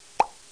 EYES POP

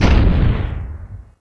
soil snow

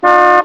Honk; Road Trip Press Kit

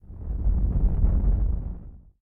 tremor; kult

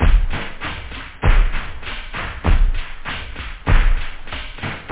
slow, loop; da capo vol1; instruments

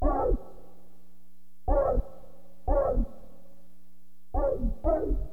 SCRTCH, HipHop; LiveAct Pool

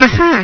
Ahah; Blobbo 1.0.2 Lite

